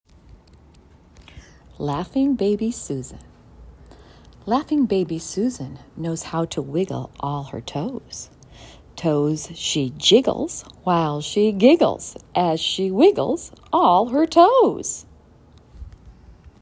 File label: Laughing Baby; First Steps in Music Poems